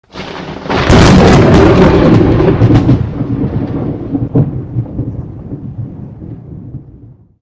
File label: thunder